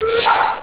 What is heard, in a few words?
hoot pop